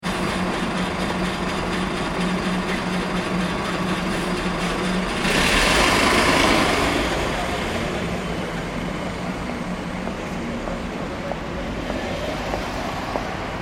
ambience urban city quiet night; City Or Town Street Ambience - Bus Idles Then Pulls Away At Start Followed By People Walki; Room Tone; Backgrounds

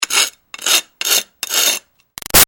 Foley Rock Scraping Trowel Archeaology; Materials

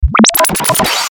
Stinger Blurp Warble; Production Element Imaging Element Accent Transition; Blastwave; Stinger Sound Effects

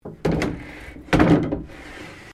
MAP DRAW OPEN, Sounds onboard HMAS Castlemaine; Boats (Sound Effects:Vehicles)

